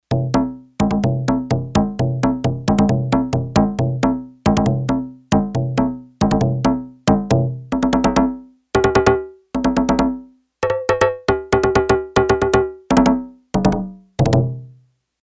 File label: Handrum, Yamaha TX802; Factory Patches; Voices